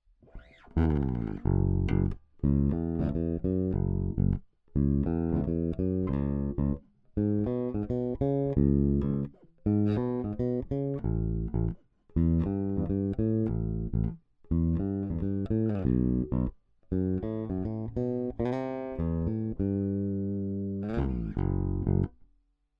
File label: Tech 21 QStrip Off E Bass